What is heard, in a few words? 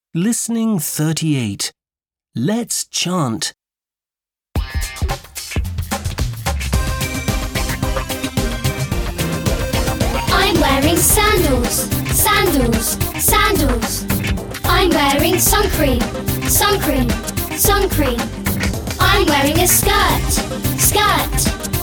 chant